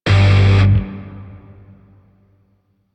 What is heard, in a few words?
Shawzin Yo Chord G; Music; Grineer; DE; Warframe